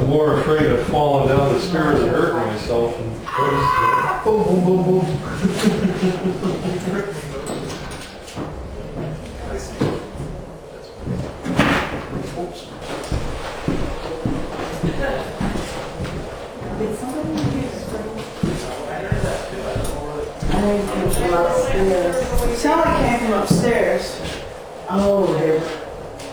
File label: Scream; Point Lookout